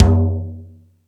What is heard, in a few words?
Rap Session, Drum Machines E-MU Pro-Cussion